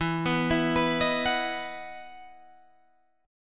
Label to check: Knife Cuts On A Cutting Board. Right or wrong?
Wrong